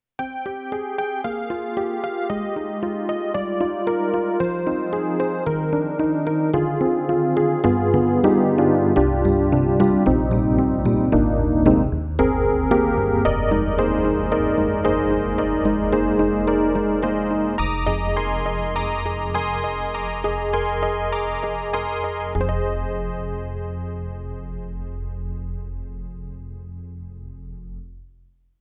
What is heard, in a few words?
YAMAHA MU90 Chorus Electric Piano 1